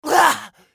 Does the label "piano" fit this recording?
No